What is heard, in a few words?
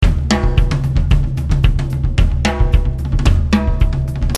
Jungle